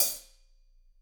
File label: HH, Drums; Bob Clearmountain Drums I